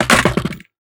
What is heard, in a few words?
riffle drop wood; STALKER; IWP